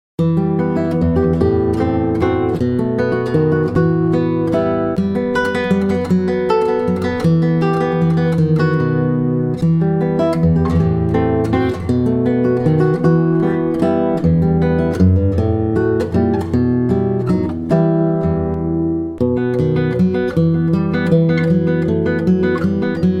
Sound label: Valse